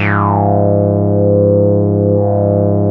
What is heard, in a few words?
TAURUS A2, synthesizer